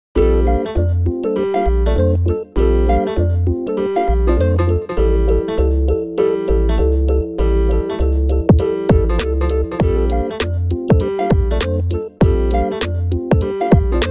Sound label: warm hiphop rap beat